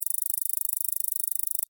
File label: Scroll; time jumper